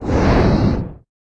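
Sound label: Hit long range fire; The Denpa Men; Android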